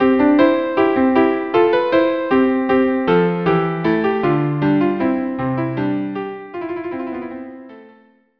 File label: viola duo; La Rej Telemann